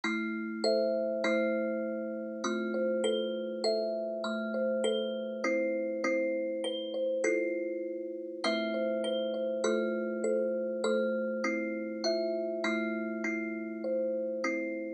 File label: KALIMBA